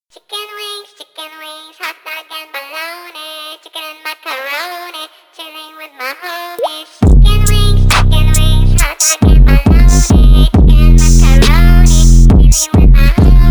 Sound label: beat